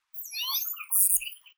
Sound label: Chirp zap chirp